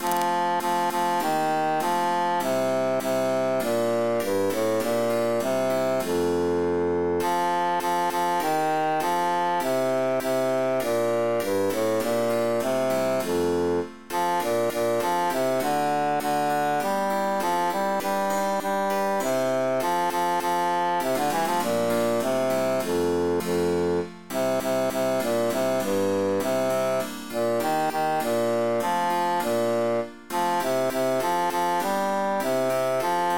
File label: bassus emphasized; matona mia cara